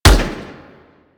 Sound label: Fire Shot; Warframe; Weapons; Burston